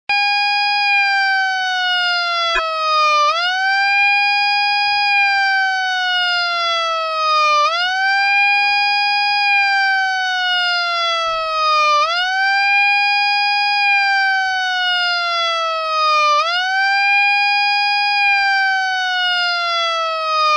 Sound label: siren